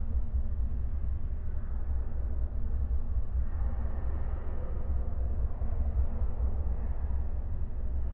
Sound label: Dark Basement Atmos Loop; horror and atmosphere fx; Silent Hill Downpour